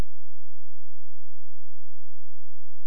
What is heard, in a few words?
Engine